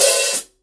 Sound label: hi-hat open